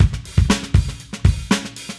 Funky Drums; Sonic Foundry (Sony Creative Software); What it Is! 70s Analog Funk; The Funky Mama

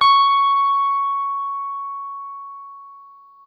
Guitar & Bass; Quantum Leap; 90's Sample CDs

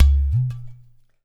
UDU; InVision Interactive; Percussion Slam; ZOMBIE

